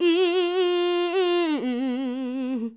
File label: Female vocals and robo-vox